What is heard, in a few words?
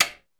Electro SWITCH; Central Processing Unit; Electro Samples Vol. 2